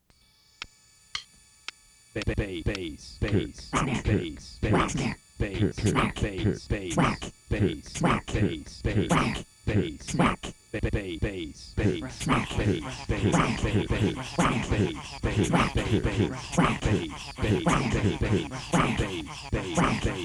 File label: vocal samples